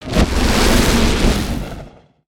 Lightning Swing; Spinjitsu

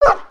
dog bark

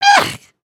thumbs down; emotes

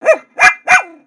Pet Dog Robotic Happy; creatures; pets; Lego

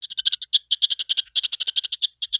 Pets Skunk Ecstatic; creatures; Lego

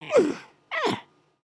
sleep twitch

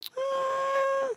curious, emotes

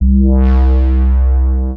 Hull Lights, ambience spaceship

